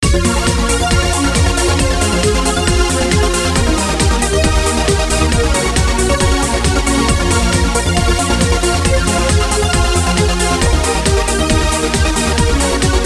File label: arp; MIDIbox SEQ V3; arpeggiator